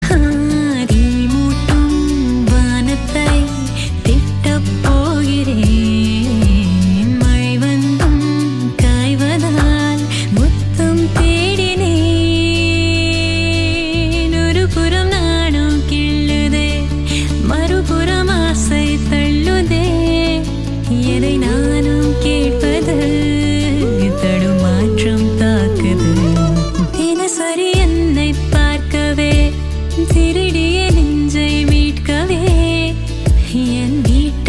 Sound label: Female Ringtone; Dhruva Natchathiram; Tamil